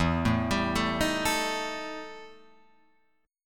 E Minor Major 7th Double Flat 5th Guitar Open F, EmM7bb5 chord; arpeggiated; JGuitar